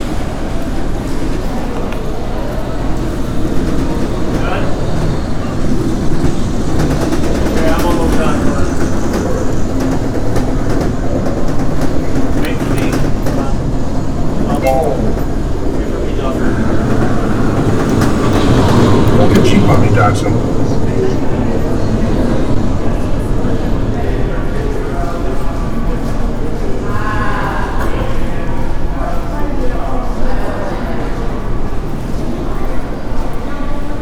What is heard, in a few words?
mall arcade; Coruscate